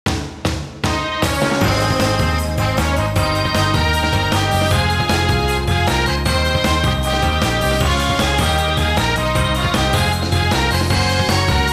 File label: Music Success event